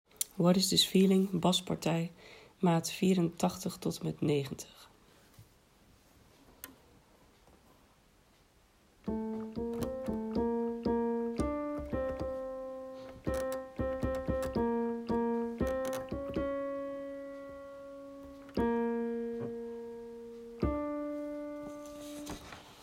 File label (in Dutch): bas